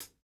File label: hihat, house